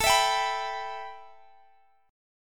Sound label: E Minor A, Em/A chord; strummed